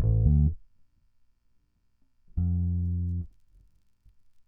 bass

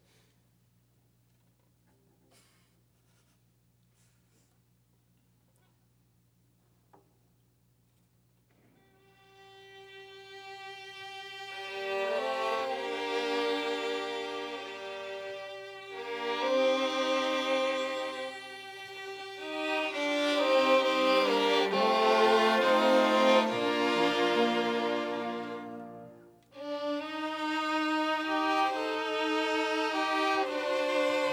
Overhead

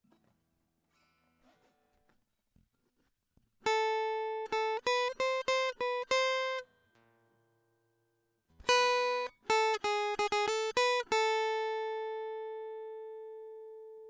guitar nice

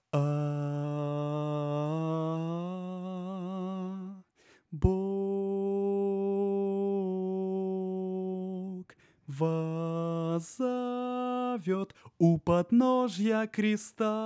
alto

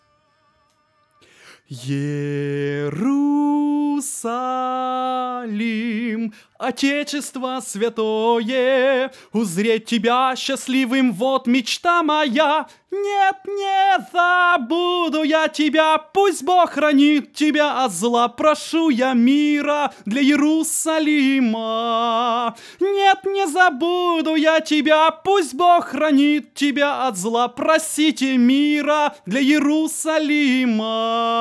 alto

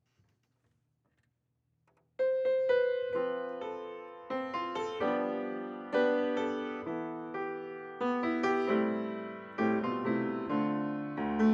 piano high

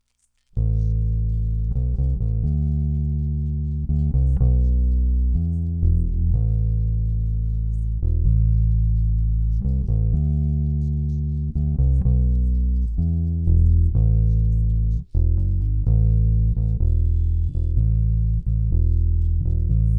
bass

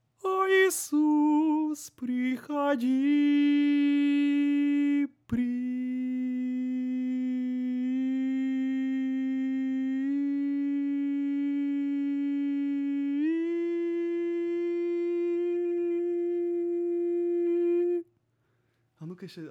tenor

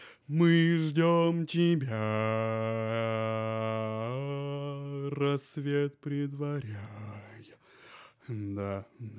bass

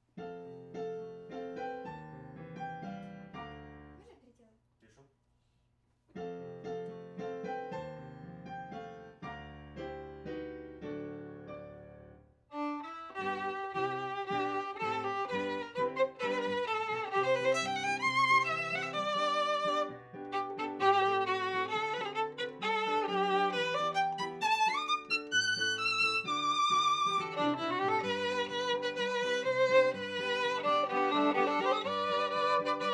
Viola C

